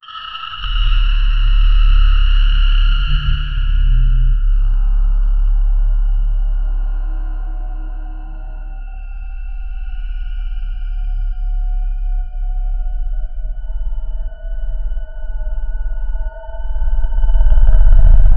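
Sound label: unfathomable cosmic horror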